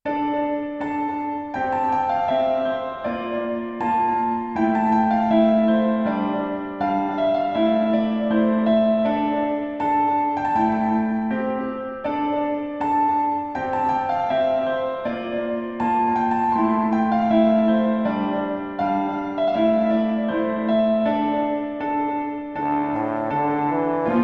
Dungeon

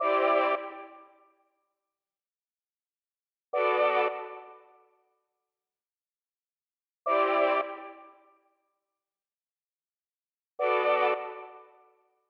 Corridor; CatLegend2

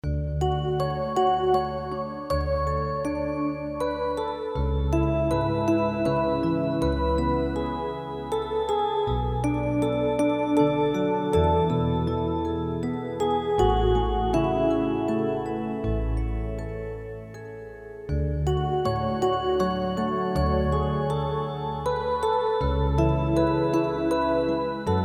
bgm

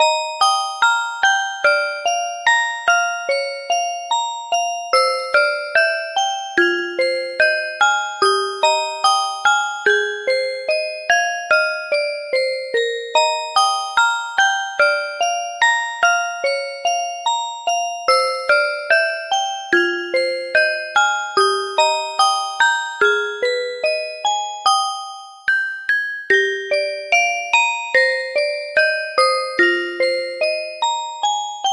Fairy

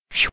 game move

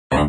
Attack

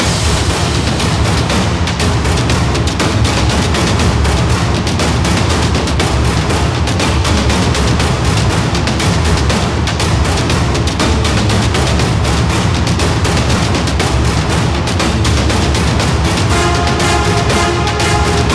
boss fight; Cat Legend

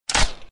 menu Open

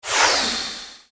wind

